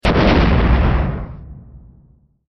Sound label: explosion; Sound Fishing